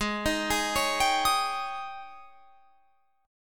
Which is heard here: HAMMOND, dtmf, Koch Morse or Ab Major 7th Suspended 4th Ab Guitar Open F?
Ab Major 7th Suspended 4th Ab Guitar Open F